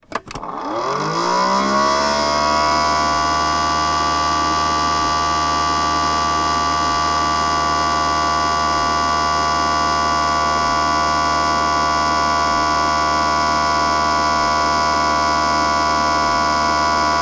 industrial machine motor small on run off; sound effects one

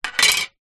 transport license plate down on surface

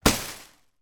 foley bin liner stuffed with clothes kick; zapsplat

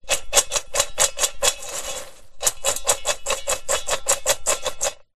food popcorn toss in metal bowl

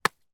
impact heavy stone land on ground

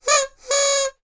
whistle party noise maker; foley

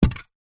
household foil tray squashed ball drop carpet

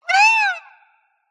squeaky metal Designed Alien Bird; PMSFX

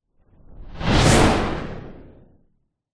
fantasy magic whoosh dark reversed powerful

